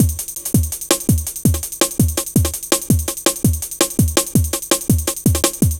Step Off, drum loop, jungle, 165.5; Zero-G Jungle Warfare 1, 2 & 3

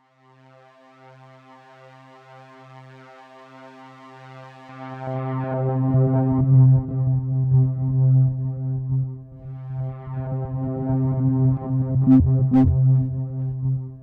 C3, SynthLine; 90 s Sample CDs; NiceBeats; SynthLine VOL-10

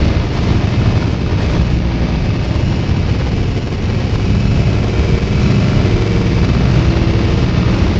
Drone Ambient; Drums & Drones

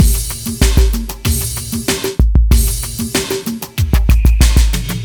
crash downtown, 95bpm

